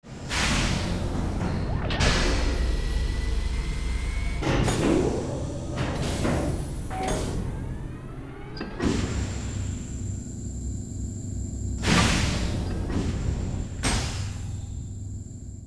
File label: gas; Seer; GamigoNA